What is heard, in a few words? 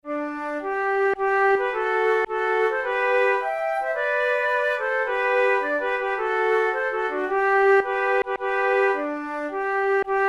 march duet; bellisle